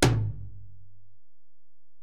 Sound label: Tom, Alesis DM-PRO; Toms